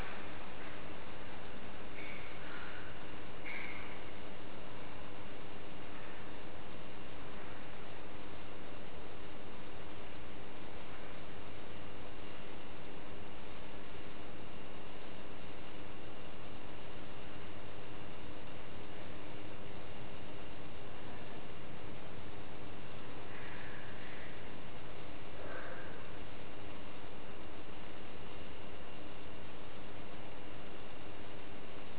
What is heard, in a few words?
parlor empty room